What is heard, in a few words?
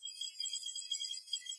binocular; federation